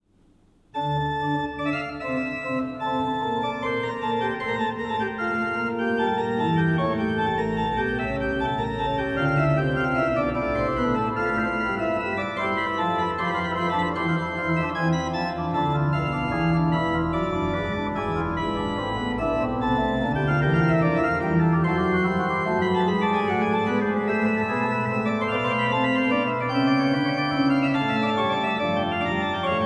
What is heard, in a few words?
Trio Sonata No. 3 in D minor - III. Vivace, Great Organ; BWV527; Bach; Bach Great Organ Works; Johann Sebastian Bach